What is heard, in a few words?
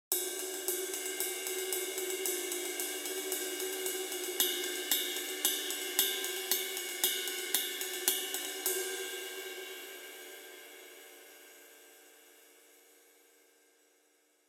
Jazz Master Ride 20 Sizzle